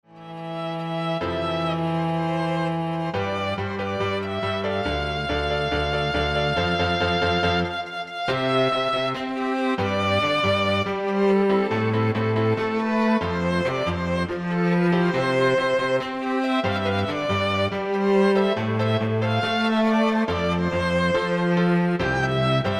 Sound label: Piano Trio